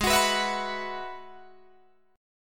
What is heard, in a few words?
Ab Minor 7th Sharp 5th Ab, down, Guitar, DADGAD